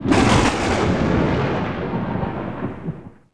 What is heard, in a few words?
THUNDER